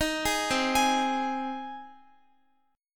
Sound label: C Minor Sharp 5th C down Guitar; Cm#5 arpeggiated